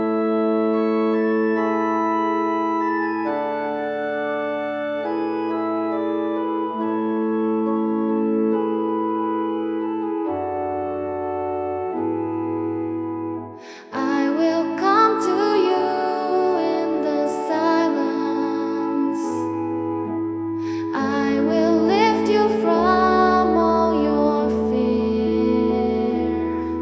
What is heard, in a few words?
voice and organ